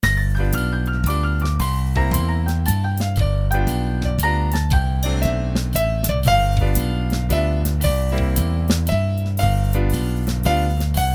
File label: Jazz